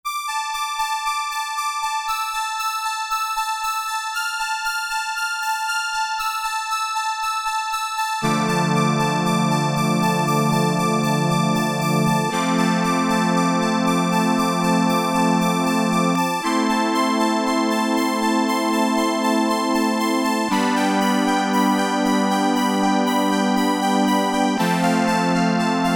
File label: Sparkling Anastrings, synthesizer; Guess the synthesizer game